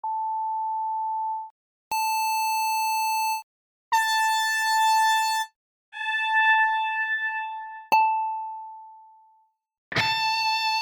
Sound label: music